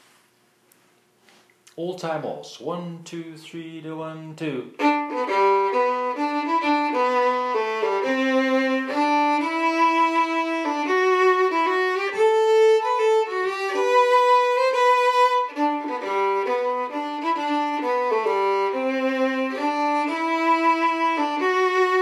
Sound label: waltz tempo